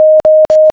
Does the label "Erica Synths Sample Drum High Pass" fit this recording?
No